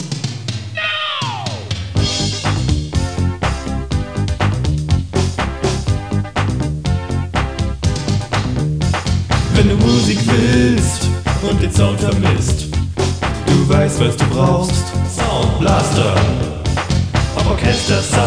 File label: jingle